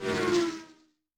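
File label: Car Pass; Yamaha DTXpress-II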